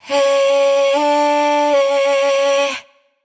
riff Hey Bm wet, Vocal Sample Pack, Raw Vocals, Wet Vocals; Slate Digital Omnivox Vocal Sample Pack